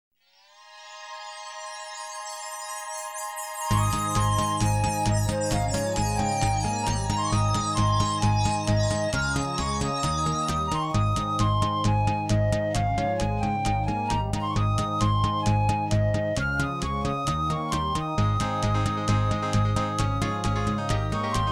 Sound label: Music- Children's Theme